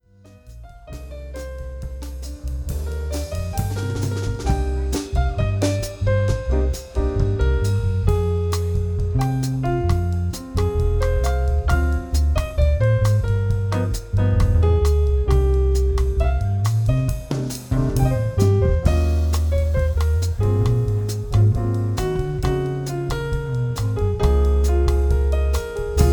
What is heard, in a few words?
piano